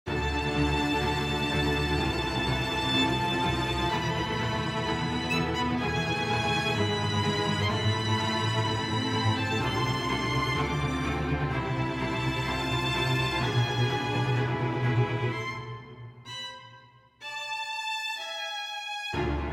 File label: BGM Drama; the letter a horror visual novel switch gamerip 2021